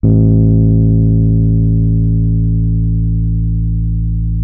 MK1F A1, RHODES; Xsample VOL-1

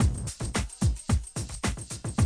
110, Classic Drum Loops

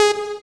SYN POLY, JD 800; Sound & Vision - Gigapack I CD 2; JD 800/990

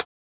133 Ragga & Dub Reggae HH; USB Soundscan vol.27; AKAI

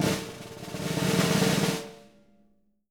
snare roll, Living Drums; Sampleheads; Peter Erskine Living Drums

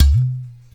UDU; InVision Interactive

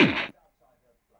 Kick Hi Speaker, Stylophone Beatbox

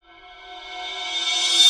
Reverse Fx; musicradar

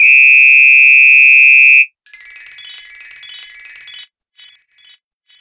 Yamaha CS6, Drums

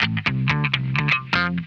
DIRTY OCT, Funk Guitar; Zero G - Funk Guitar

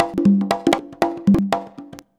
CONGA 119, Modern Percussion Loops

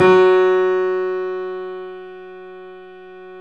Yamaha C7, 3D Pianos; E-MU Producer Series Vol. 5

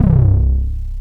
BASS, 170 BPM; Ueberschall; Drum & Bass Resonance

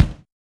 RX8 BD, Yamaha RX-8

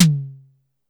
Drum X Tom, Pearl

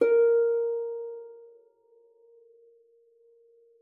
harp forte; Xsample VOL-8

